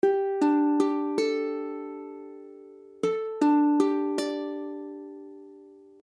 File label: Gsus2, akordy ukulele